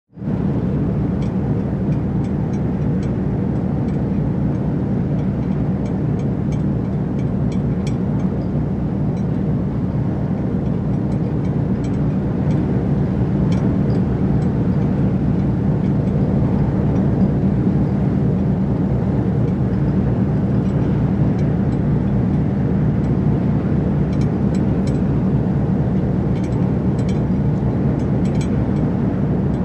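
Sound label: BOATS & SHIPS OCEAN LINER: exterior: Engine rumble from deck, flagpole rattle in wind.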